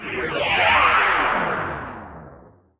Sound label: sweep, SCIFI; Techno Trance Essentials